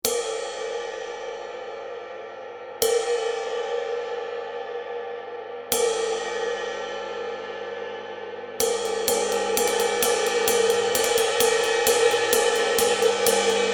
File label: 22" Gold Ride, Bosphorus; cymbal; 3375g